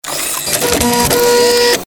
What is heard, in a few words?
servo action style